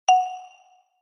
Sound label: alert